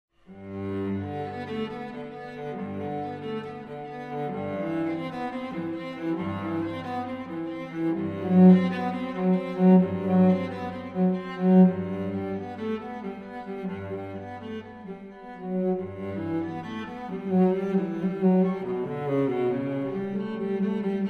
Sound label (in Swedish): cello